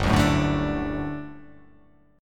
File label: G Major 7th Suspended 2nd G down